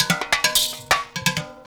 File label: Pot Percussion, Percussion Loops; Ambient Grooves